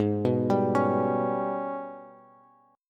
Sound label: Ab Minor Major 11th Ab, Bass Bass Standard; arpeggiated; JGuitar